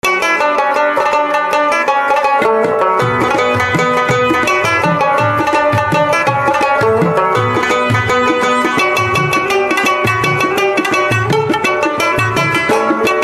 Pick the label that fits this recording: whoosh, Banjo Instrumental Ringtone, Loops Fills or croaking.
Banjo Instrumental Ringtone